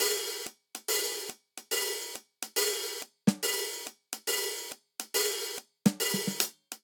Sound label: Swing Jazz Intro 140 bpm; MIDI Clips; Ableton; Factory Packs; Drum Booth